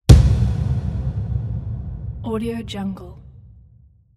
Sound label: cinematic sonic boom